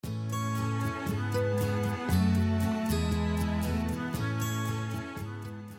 Instrumental Foxtrot

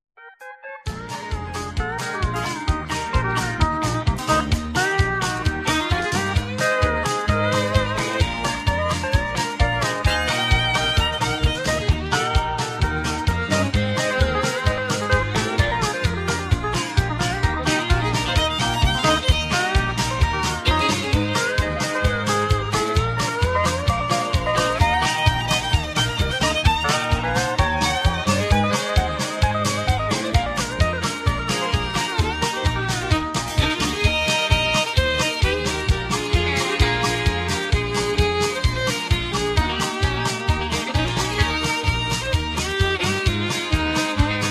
low key

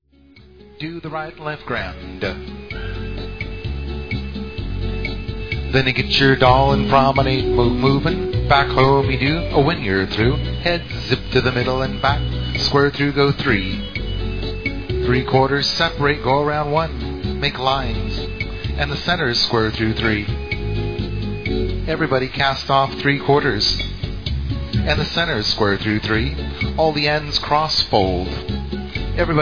Rumba Patter

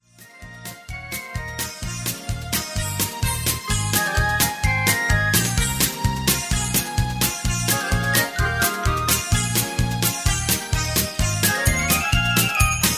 Patter

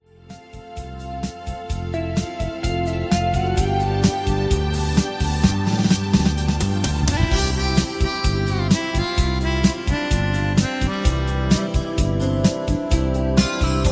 High Key; Singing Calls

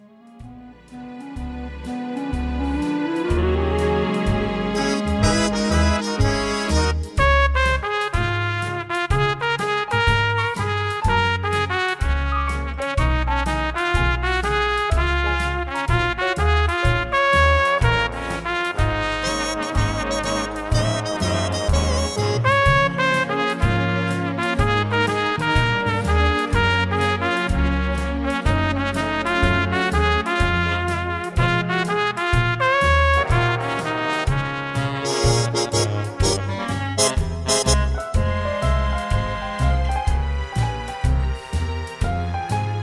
Instrumental

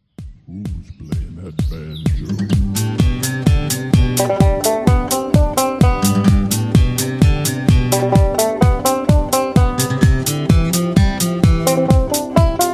Patter

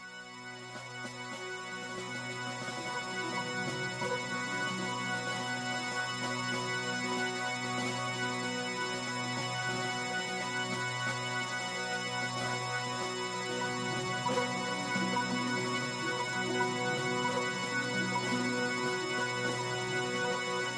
High Key

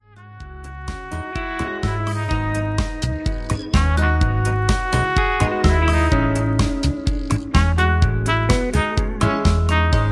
Instrumental Strong Lead Trumpet

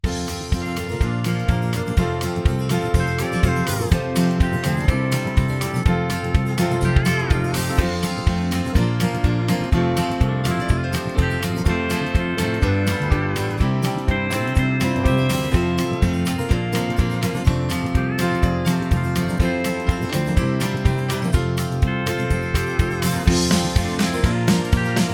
Instrumental with Key F

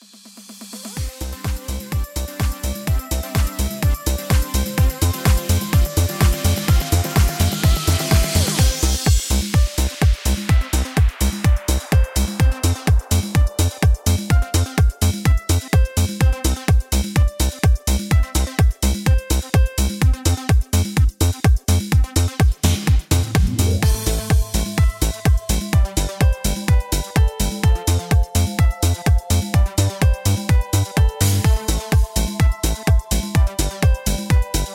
Instrumental with Harmony

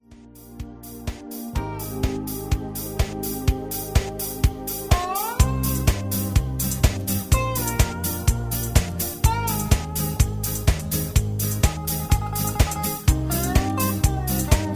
Hoedown Patter

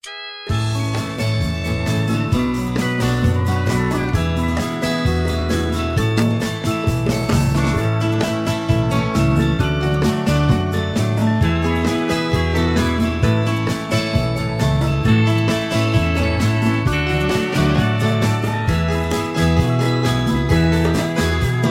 High Key

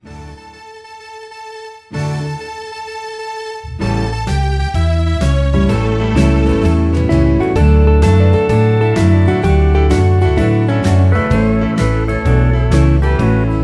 with Strings

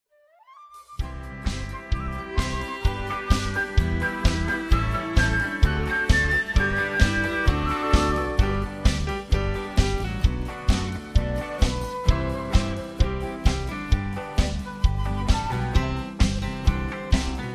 Instrumental

